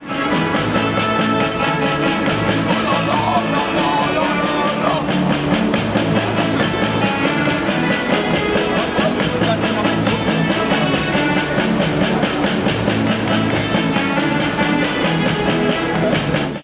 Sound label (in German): leipzig hbf drehorgel orchester